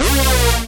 Synths A#; Vengeance Samples

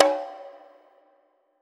Conga; Dubstep Sample Pack; Percussions

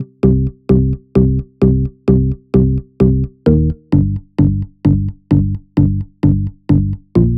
Bassline

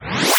Sweeps Short

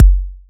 126BPM Kick; Vengeance Samples; Lineup Kit

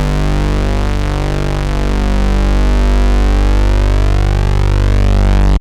Low Bass G1, Moog Voyager; Moog Voyager Sounds; VES1 Low Bass

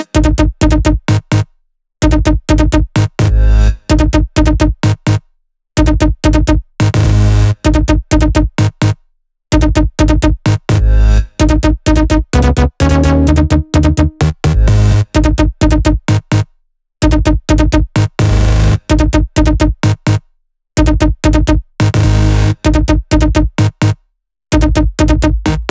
Melody Kits 128BPM Root G#; Vengeance Samples

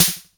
Claps & Snares

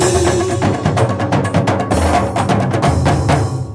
Latin House Loop 128 BPM; Vengeance Samples

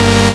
Synth Shot; Vengeance Samples

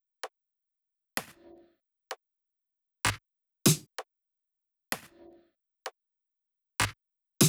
128BPM Drums; Vengeance Samples; Body Kit

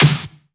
Snare; Vengeance Samples

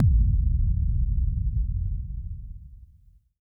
137BPM Boom; Sound FX; Vengeance Samples; Universe Kit

